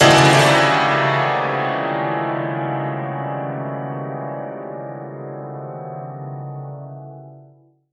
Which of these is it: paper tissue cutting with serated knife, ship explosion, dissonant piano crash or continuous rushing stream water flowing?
dissonant piano crash